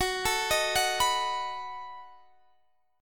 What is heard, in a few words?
B 7th Gb